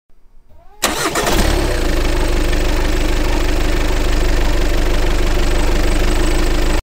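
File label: Motorcycle handle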